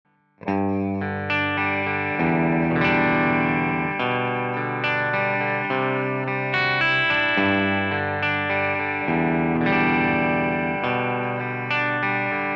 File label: Schecter SLS Elite PT Bridge